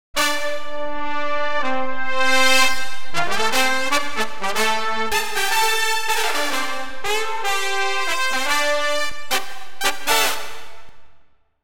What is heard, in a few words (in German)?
Fanfaren Trompeten Pur; la trompeter fanfare 0:13